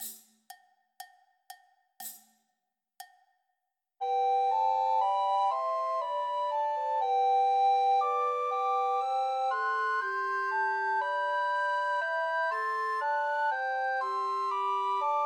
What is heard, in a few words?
Unto Us a Boy is Born, Christmas Trios for Two Descant and One Treble Recorder